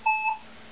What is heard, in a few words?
beep